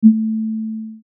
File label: Beep Tone; Multimedia Web Interactive; Technology; Beeps; Blastwave